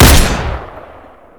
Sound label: syringe shot shoot; weapons